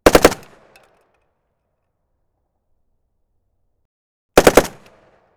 M1919A4 Browning Machine Gun .30cal on turret 1m left blanks Triple shots; Pole Position Production